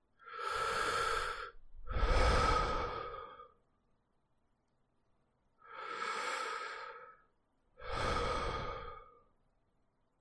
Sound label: breathing